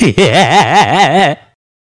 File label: goofy laughh